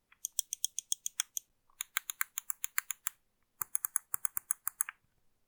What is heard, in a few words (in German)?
Maus 5 mal DPI Forward Back